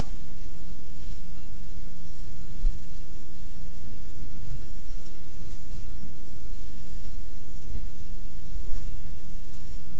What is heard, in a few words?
light streak sound